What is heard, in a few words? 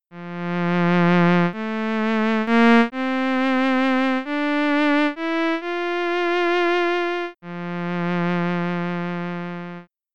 cello no delay; cobalt